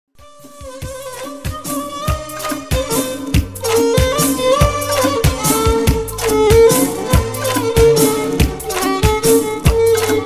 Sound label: Violin